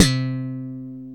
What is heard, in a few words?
C-2 SLAP, bass